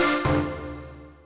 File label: Explosion; Powerup Hit Animation; Super-Robo-Squad